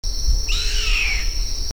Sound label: Milvago chimachima